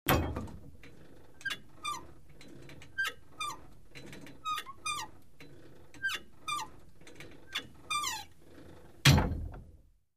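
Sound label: Gym Weight Equipment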